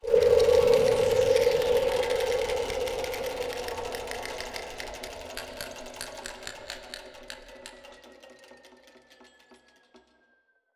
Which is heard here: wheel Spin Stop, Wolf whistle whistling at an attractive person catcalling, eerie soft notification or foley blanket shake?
wheel Spin Stop